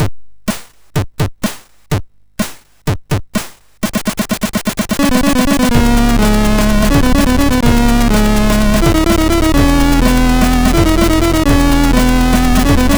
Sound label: Heavy Metal Suxxx, MOS6581R2